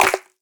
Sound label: clap f sharp key; percussion; single hits one shots